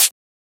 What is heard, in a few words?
Closed Hat; FLPRO